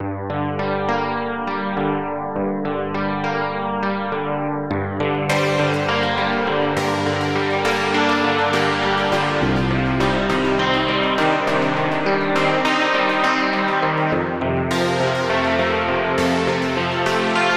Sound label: electric piano voice pad